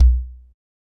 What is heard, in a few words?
fat bass drum b key